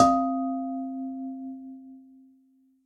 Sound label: Ceramic Bowl, Fleamarket Percussion; Instruments; Ableton; Factory Packs; Lost and Found